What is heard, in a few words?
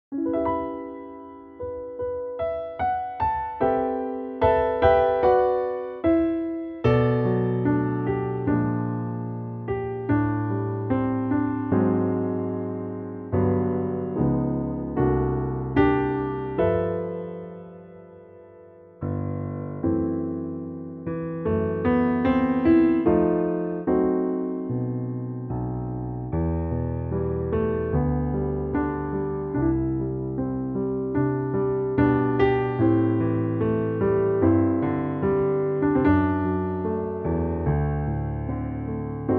piano; key - G - vocal range - B to D; piano only arrangement